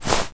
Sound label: run foley